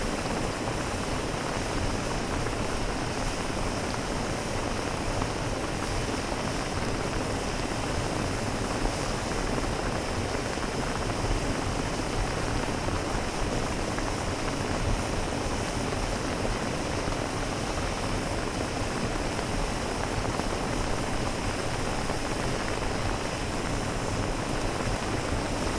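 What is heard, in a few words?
Water Bubble Water Boil; water sounds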